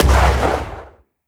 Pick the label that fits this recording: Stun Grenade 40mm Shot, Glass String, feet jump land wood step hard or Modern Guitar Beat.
Stun Grenade 40mm Shot